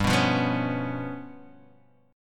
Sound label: G 7th Sharp 11th, G, guitar chord; G7#11 strummed